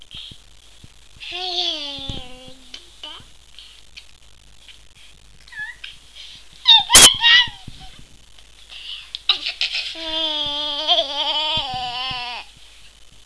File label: laugh